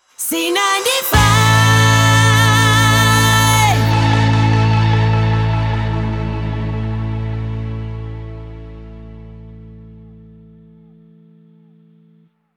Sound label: Jingle Weather Outro; C95 Jingle Package